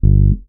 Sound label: Electric Bass Low G Staccato